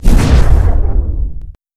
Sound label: force repulse; lightsaber